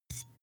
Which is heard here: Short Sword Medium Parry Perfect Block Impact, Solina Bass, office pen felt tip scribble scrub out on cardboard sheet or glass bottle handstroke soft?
glass bottle handstroke soft